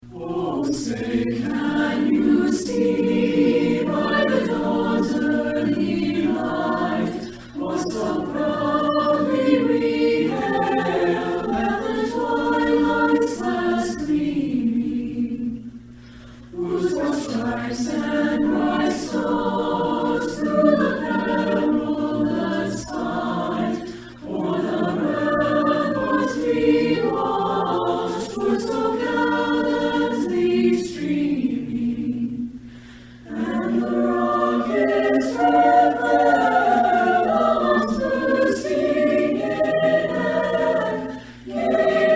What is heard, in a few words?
elk choir